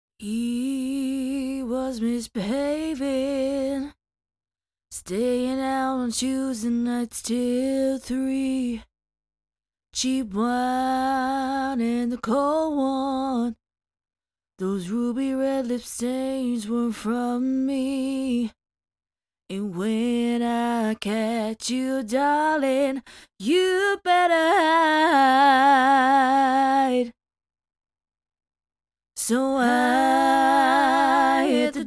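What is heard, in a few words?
pop country 95 E flat major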